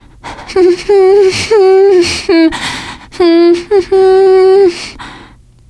nervous humming, teen girl; player; survivor; voice

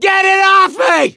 scream while pounced; survivor; gambler

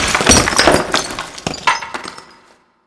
junk debris, ambient

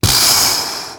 negative noise, survivor voice

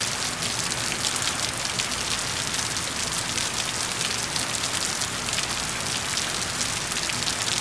surfacerain light loop, rainscapes

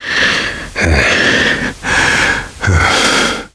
heavy breathing; survivor

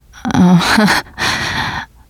laughter, teen girl

